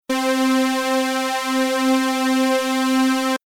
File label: Mix Pan St Wide